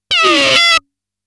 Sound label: Aliens Monsters; FXHome